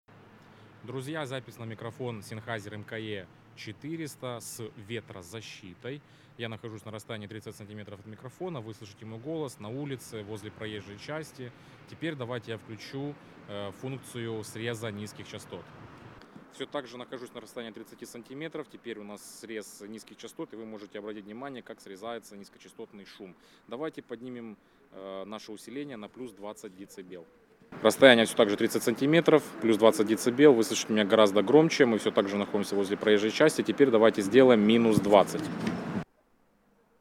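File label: sennheiser mke 400 street with windscreen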